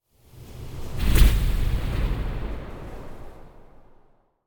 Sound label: Hit Pouncing Scary Hits - Deeper Hit; Hollywood Edge; sound designer's toolkit v2